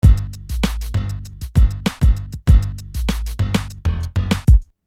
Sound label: Beat, Hip-Hop Street Beats